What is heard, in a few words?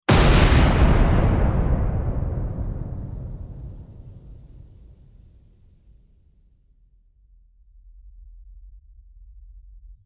Grenade Explosion; explosion blast sound effects